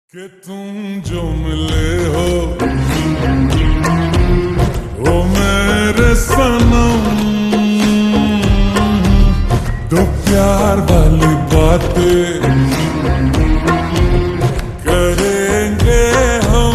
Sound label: Slowed Reverb Ringtone